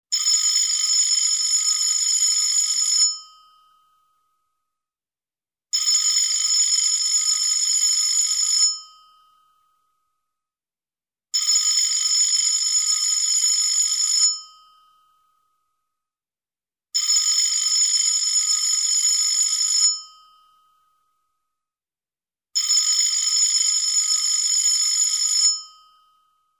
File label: Old phone call